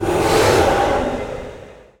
Final boss Following skull spawn

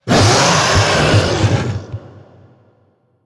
Monster Bonus Win; Monster George Bonus Win

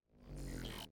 Battery Plate Light On

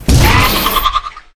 Crab Hit

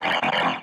attack Voice ground Fire; enemies

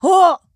Flyer Attack; Hollow Knight; Col Flyer Attack

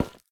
Footstep Solar Panel

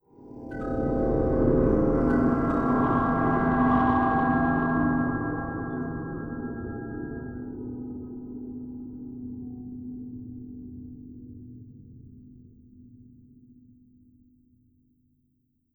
Egypte Intro

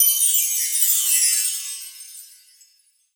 Chimes Magical Bells

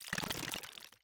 Glitch-Effect; Boss-Fight

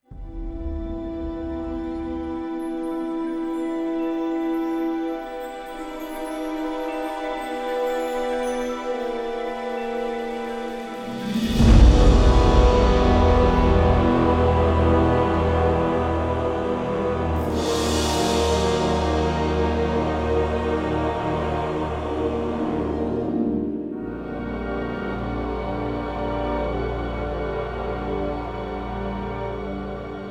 witch music

